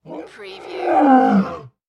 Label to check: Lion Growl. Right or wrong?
Right